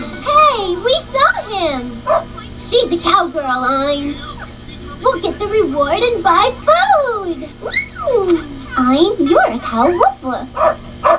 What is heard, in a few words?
woof woof